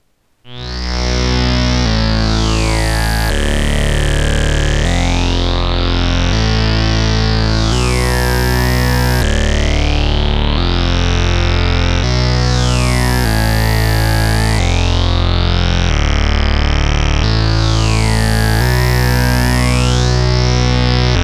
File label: E-mu Dark & Swirly